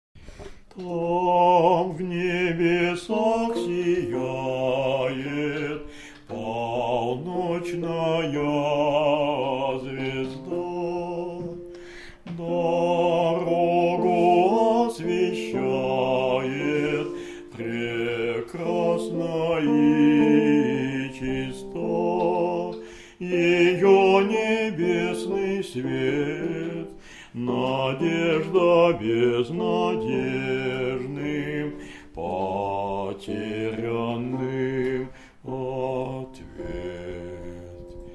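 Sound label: bass; tam v nebesax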